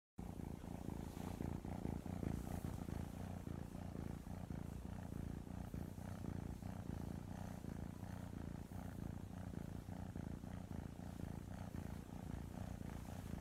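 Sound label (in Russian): uspokaivaushiu mur koshki, soothing cat purr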